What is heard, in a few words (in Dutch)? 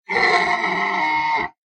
Hert